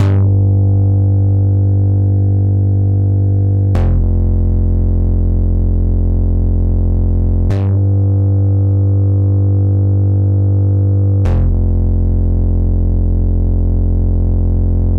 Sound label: Poly Bass; super crush k.o.